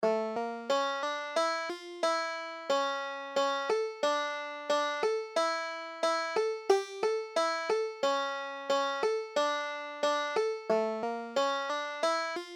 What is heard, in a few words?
tanz tanz yidelekh, banjo, Klezmer; Standard G Dm